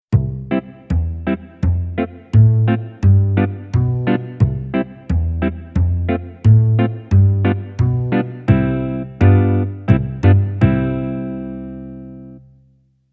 Acoustic bass jazz guitar chords

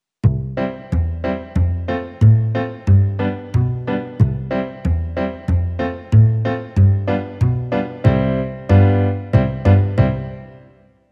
Acoustic Bass Piano Chords

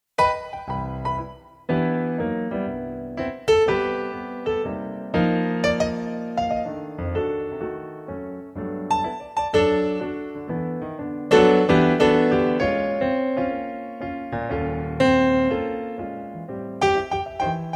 Grand Piano; V3 Sonority

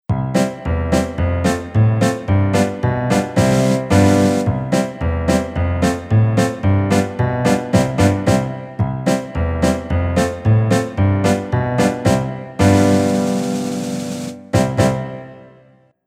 Ac Piano bass Piano Chords bass drum snare Chords

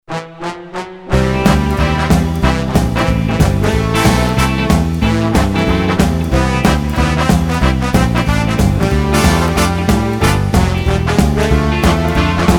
BIG BAND QUICK STEP

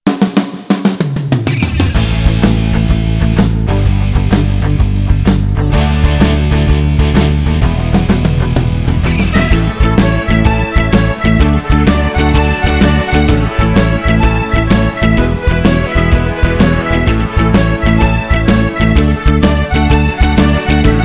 ROCK SCOTTISH, accordion

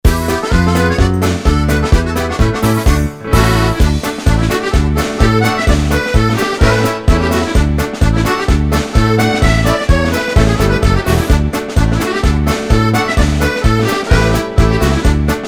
REELS, accordion

